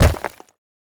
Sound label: Hit